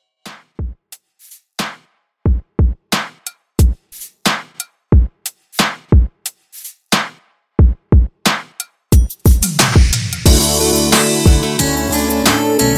Extended Redrum 90 Bpm

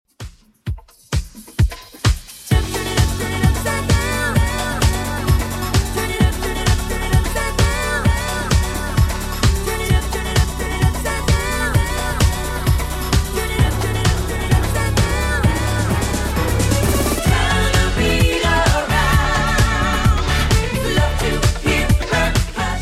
90s Redrum 130bpm

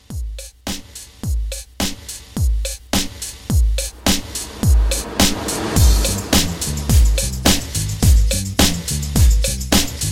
70s Soul Re Drum 106

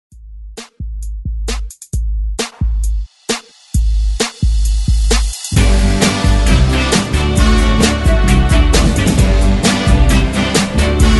60s ReDrum 132Bpm; DJDOLLS

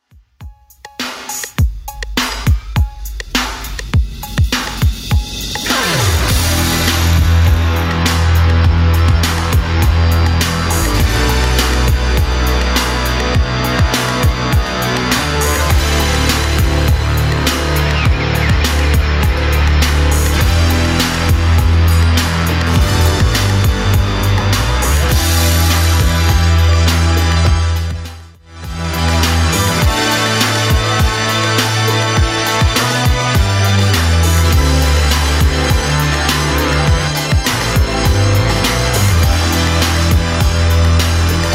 80s Redrum 102bpm

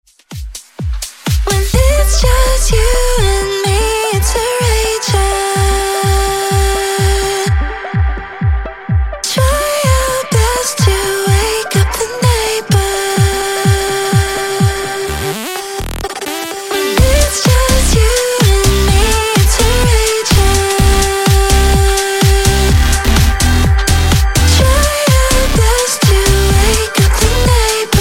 Top 40 Intro Outro 126bpm

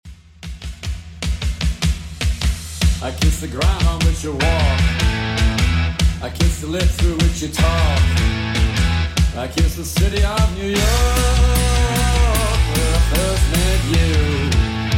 80s Rock Redrum 151 bpm

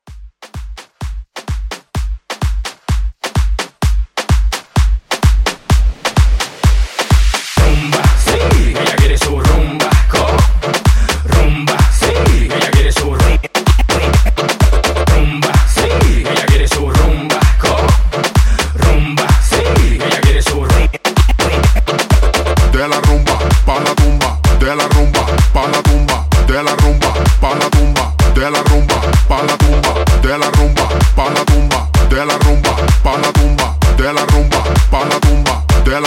Latin Rumba 128; Latin Rumba Edit

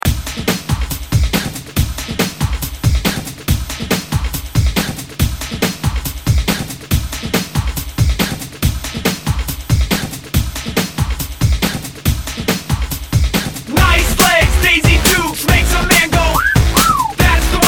Dj Intro Outro Dance 2000S; Redrum

